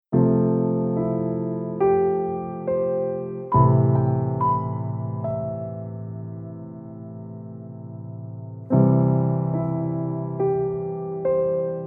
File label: Weight; Noisebiscuits